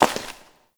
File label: Step Ice; Warframe; Koumei